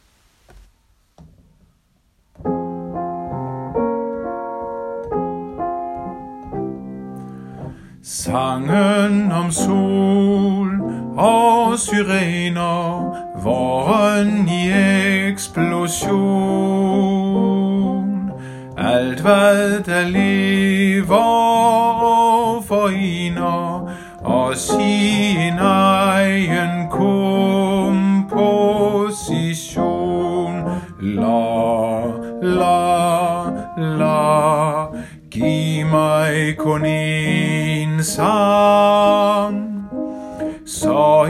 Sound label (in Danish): Vårvise Bas